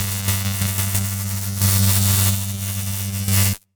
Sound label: Accent, Sound Design, Electric, Arc, Buzz, Resonant, Fizzle, Surges, Long; Airborne Sound; Electric-Magnetic